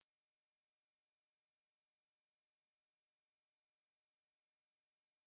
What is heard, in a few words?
high pitch